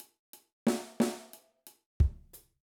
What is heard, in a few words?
Eighth Note Example, drum